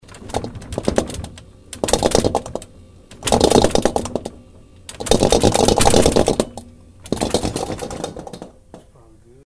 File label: Rattling Sound